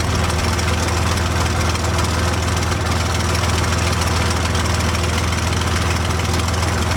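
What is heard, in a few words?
Plane P40 Interior Idle Loop; Airplanes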